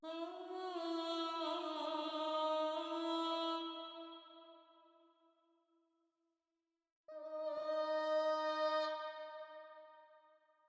136 BPM C# Min Vocals; Melodies; Loop Stems; Cymatics; Red Sea; PHARAOH Beta Pack; Pharaoh Premium Drum Samples (BETA)

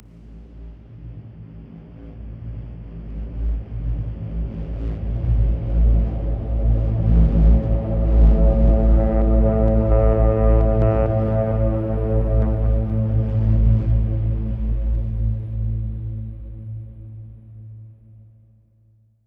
Distant Horn - Am 87Bpm; Construction Kits; STEMS; Ghosthack; SkyRealm; Kit 18 - Shivering Sea

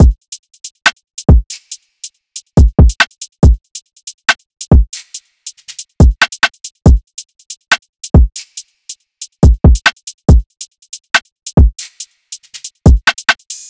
Drum Loop - 140 BPM, drill; Brute

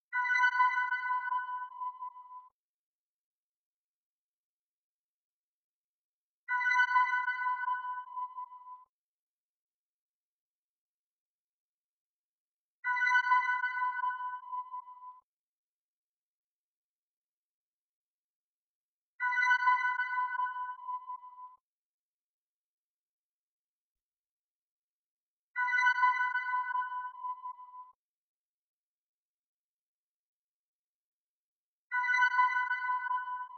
151 BPM B Min Synth, Trap; Cymatics; 2022 Melody Collection; G Wagon

